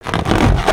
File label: Ballloon Animal; weapons; aronwk collection; ndaudio